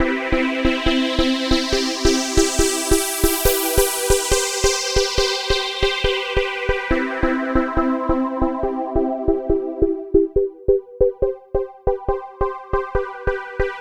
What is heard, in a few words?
Trance Synth